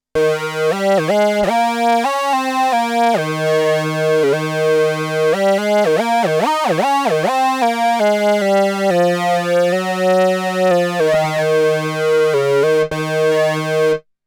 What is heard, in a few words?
MOOG